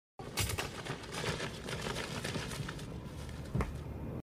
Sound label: scroll; ninja